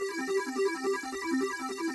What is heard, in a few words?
slot spin